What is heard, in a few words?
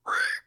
buzzer startle